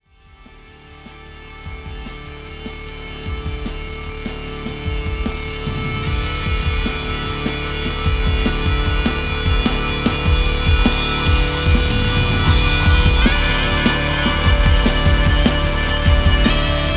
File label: Stoner Harp